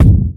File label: footstep giant; dota2